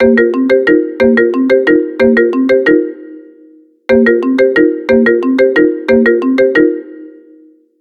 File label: Marimbas, ringtones; Explay Neo